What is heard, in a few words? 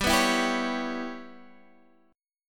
G 6th Flat 5th, Guitar, DADGAD